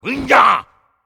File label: Moustache Attack